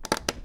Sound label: chest open; Season Land